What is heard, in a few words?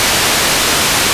Noises Analog; Xfer Records Serum; Serum Presets